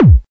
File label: helium kick; Kicks; single hits